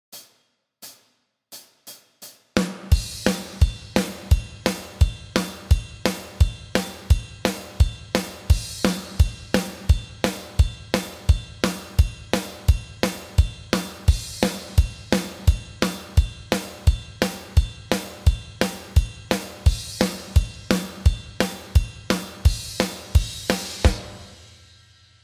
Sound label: Drums